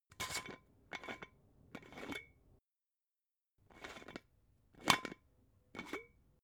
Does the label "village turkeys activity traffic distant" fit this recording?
No